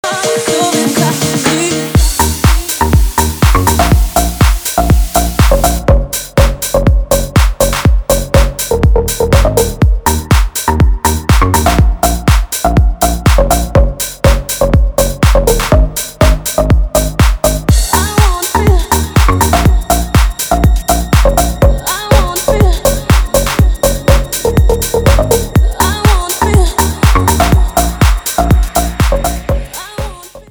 deep electro feeling

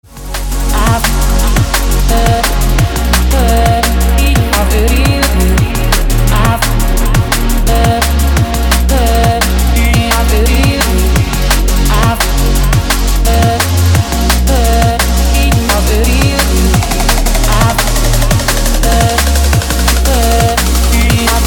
drum bass

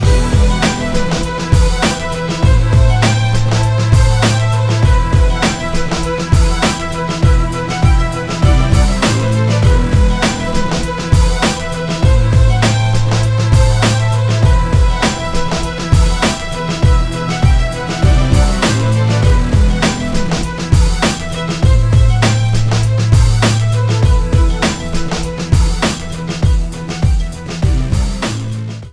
menu loop; castle crashers

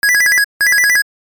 classic ringtone

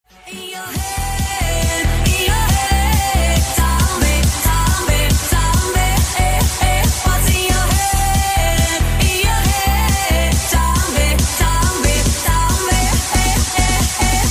zombie remix

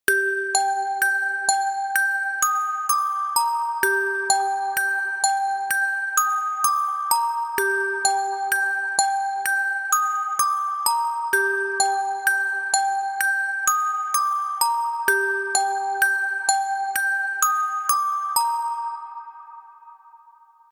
mistik bells

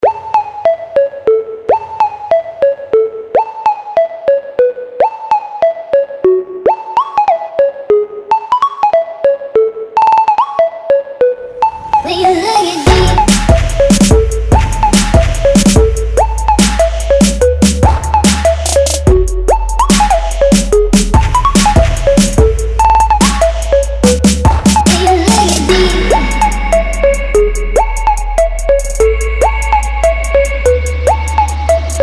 future trap

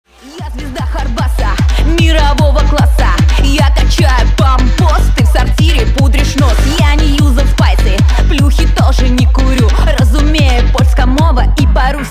hard bass

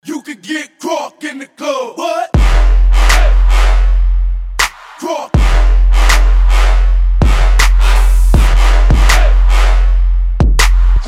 crunk

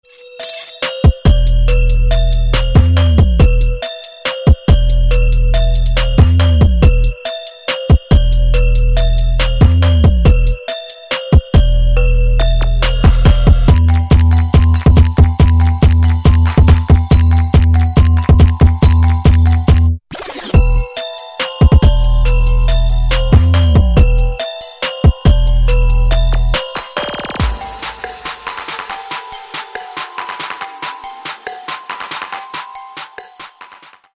drum machine instrumental

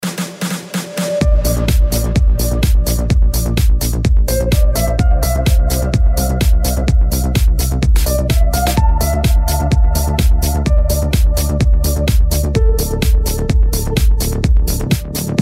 techno

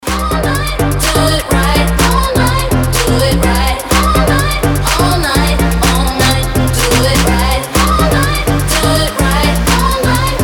electro house

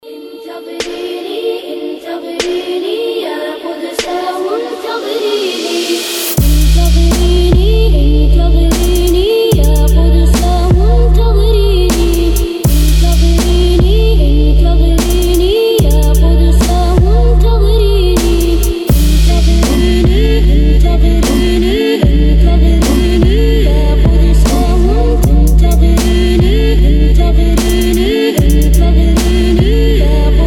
arabic trap

